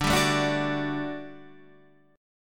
G Major 7th Suspended 2nd D

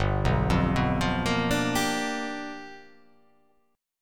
G 7th Sharp 9th G chord

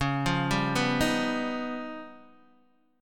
Db 9th Flat 5th Db down Guitar Open D

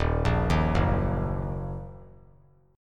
D sharp Minor, chord; arpeggiated; JGuitar

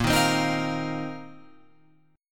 Bb Suspended 2nd Suspended 4th, Guitar Open F; Bbsus2sus4 strummed

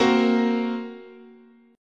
Bb Suspended 2nd Sharp 5th, guitar chord

